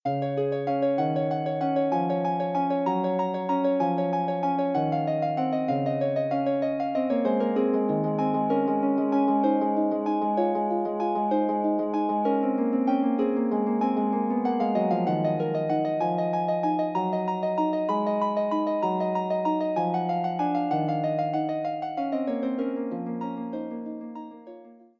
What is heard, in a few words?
prelude, Harp